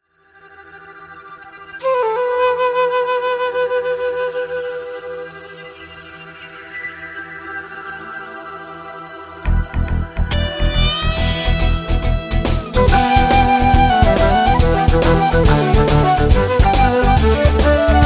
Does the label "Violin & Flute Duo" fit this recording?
Yes